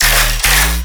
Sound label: Bass Hit D#, Dubstep